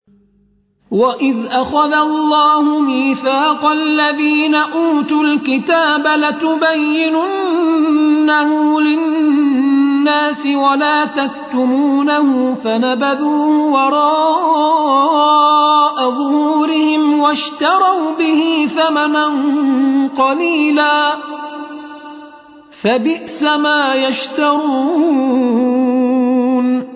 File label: Tar